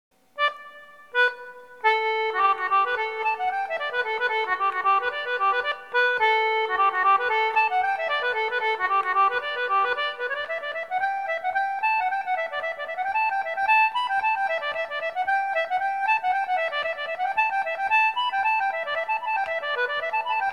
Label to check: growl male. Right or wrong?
Wrong